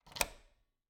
Cabin, front door, deadbolt, lock, latch, thick, close, stuck, wide; Eiravaein Works; Vaeyan IV